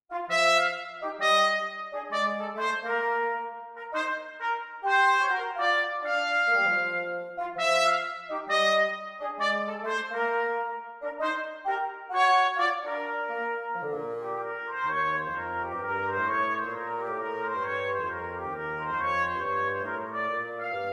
Bouncy Bouncy

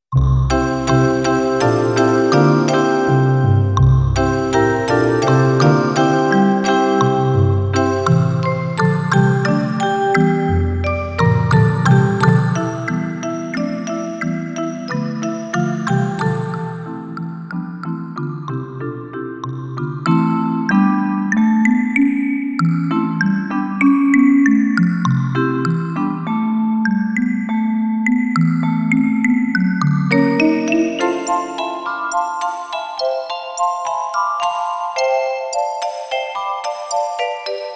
5ths

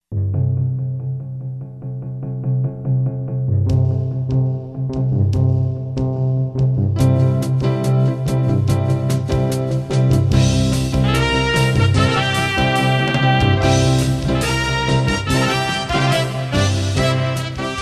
Barcelona instr

